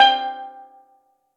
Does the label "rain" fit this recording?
No